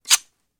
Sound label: Knife Draws, knives